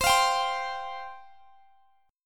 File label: C Suspended 4th C, Guitar, Open G